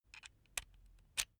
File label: electric drill bit insert; maschinen; bohrer; soundpool